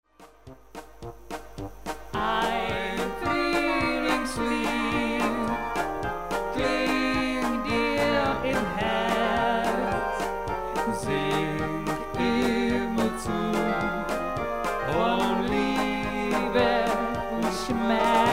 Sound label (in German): Amsel Polka